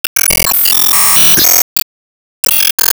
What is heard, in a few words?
Sys Bonus Time